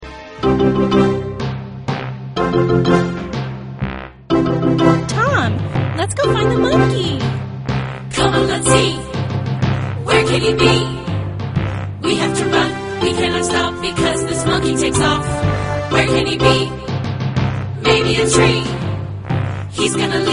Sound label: tom